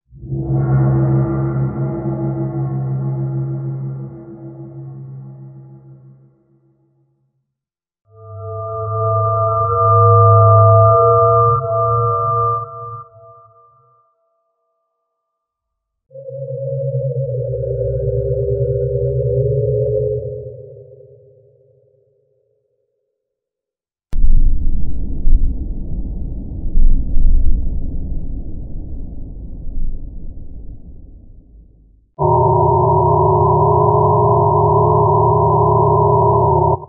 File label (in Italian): suoni terrificanti effetti sonori horror suoni spaventosi bhoot sound suspens